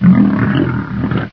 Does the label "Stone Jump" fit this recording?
No